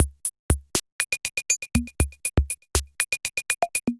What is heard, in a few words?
C78 Substance Kit, Drum Machines; Ableton; Factory Packs; Drum Essentials